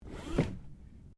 Car Toyota Corolla seatbelt pull; 2009 Toyota Corolla hatchback; Cars (Sound Effects:Vehicles)

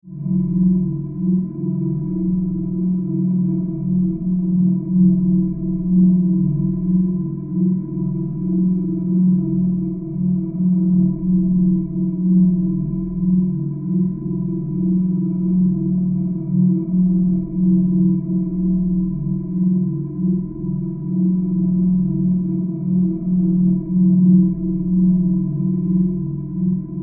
Spaceship, Alien, Interior, Hallway, Scifi; BlastWave

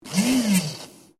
Milkshake Machine On Off, Milkshake mixer; Food Prep; Restaurants

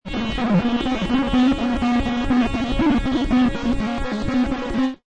Glitch

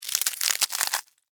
Crunch Pretzel Stick; Texture; Crunches; Objects; BlastWave

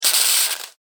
Household Pesticide Can Spray; Yard Tools

